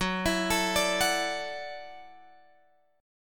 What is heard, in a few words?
F sharp Minor 7th Sharp 5th, Guitar Drop D; chordshape; down